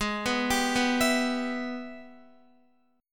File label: Minor Major 7th Sharp 5th, Guitar; G#mM7#5; arpeggiated; x,11,11,12,11,12; 4F,4A,5C,5G,5C,6E